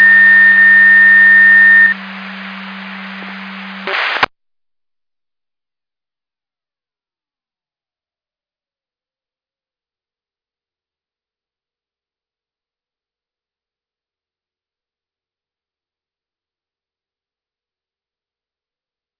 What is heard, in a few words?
Siren; Hamburg FD